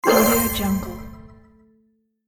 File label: magic beast